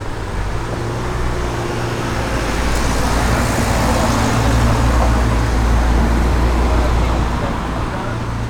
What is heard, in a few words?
Loud Truck Driving